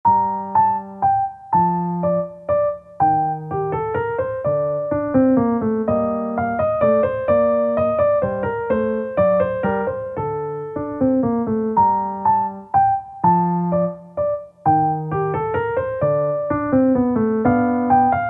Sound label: Minuet in G Minor, piano; BWV Anh. 115; Bach; piezas faciles piano